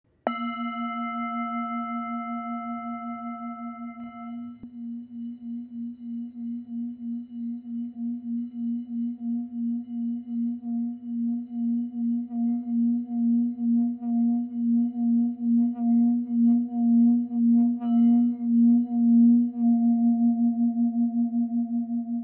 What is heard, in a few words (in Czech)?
tibetska misa